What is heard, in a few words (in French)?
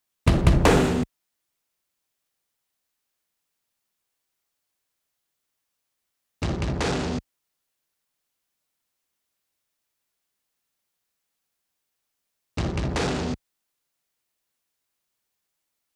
batterie satu